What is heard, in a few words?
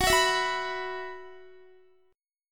F sharp 7th Suspended 4th, Guitar; strummed; x,21,18,21,18,19; F#7sus4 Chord